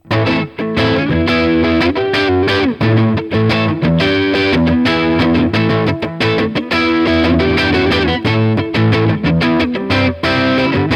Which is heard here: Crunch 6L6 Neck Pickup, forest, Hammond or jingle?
Crunch 6L6 Neck Pickup